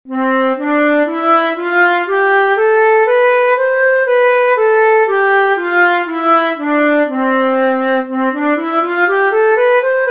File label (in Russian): gamma do major